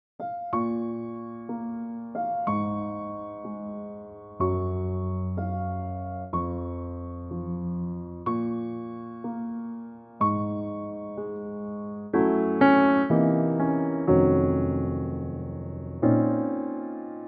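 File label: Bbm piano, male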